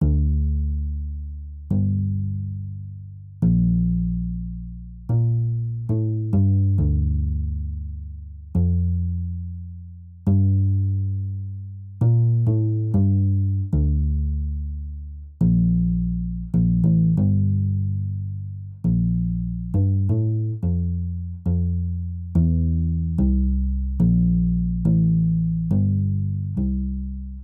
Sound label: bass